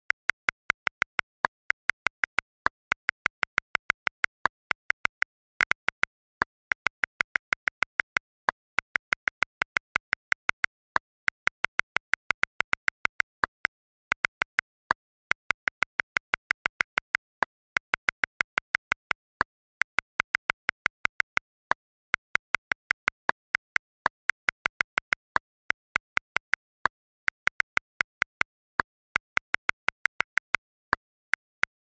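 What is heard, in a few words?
iphone keyboard sms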